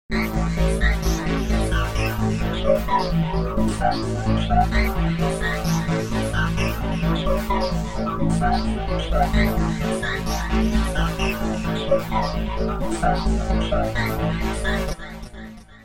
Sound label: Sytrus Flanger